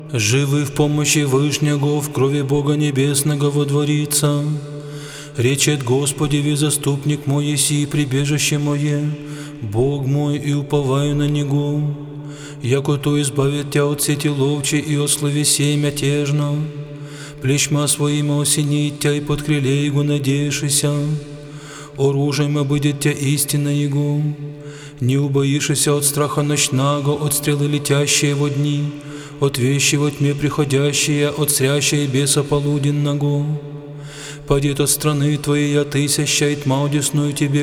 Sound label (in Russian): Psalom 90